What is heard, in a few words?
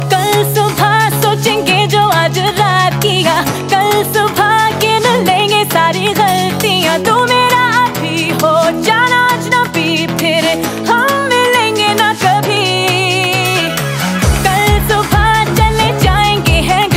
Hindi Ringtone